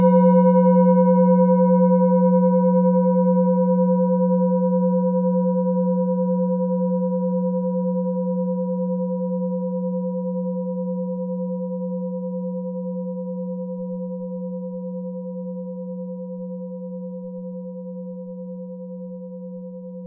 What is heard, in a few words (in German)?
orissa planetentonschale